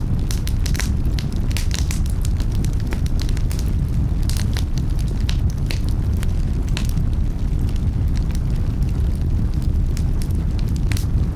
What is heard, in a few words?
Fire